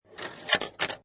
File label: Pinball Sound Ball Slide On Metal And Close; Video Games; Lynne Music